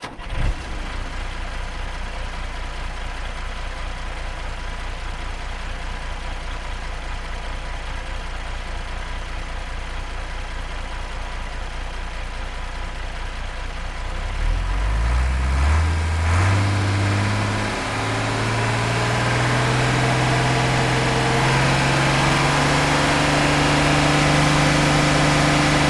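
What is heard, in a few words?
vehicle truck unimog onboard turn on start ramp rev up and down x2 stop shutoff mix; Sonniss GDC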